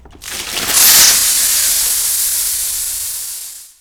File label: boiler extinguish; Hellboy